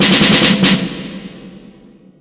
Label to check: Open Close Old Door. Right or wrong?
Wrong